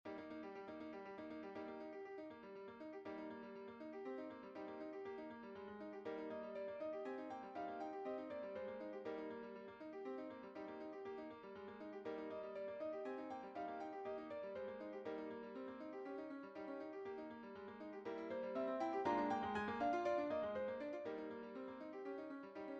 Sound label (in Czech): klavír; faure; sanctus